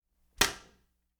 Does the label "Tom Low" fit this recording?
No